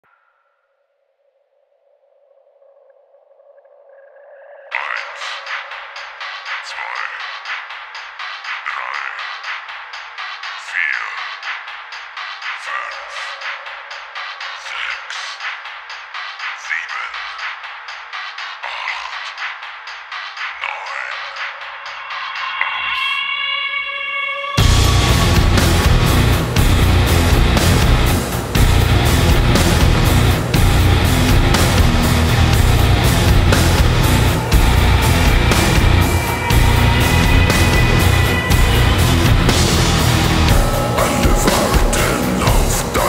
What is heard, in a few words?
slowed reverb